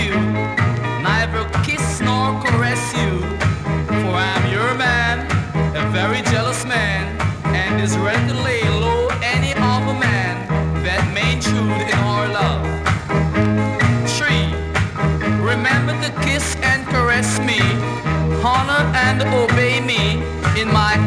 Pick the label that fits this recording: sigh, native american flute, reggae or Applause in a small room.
reggae